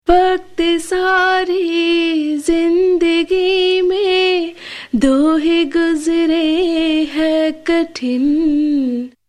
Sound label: Vocals Tube Comp EQ